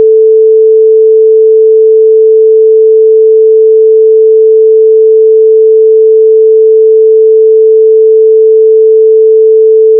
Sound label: sinewave 440Hz